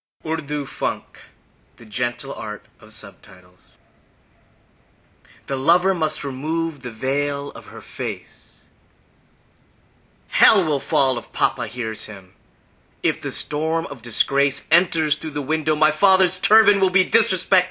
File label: urdu funk